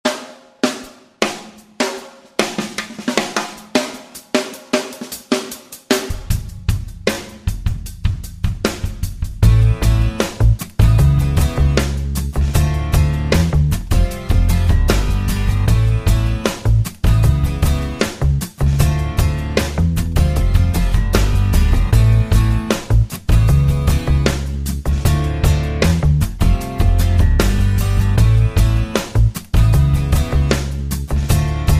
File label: flux dry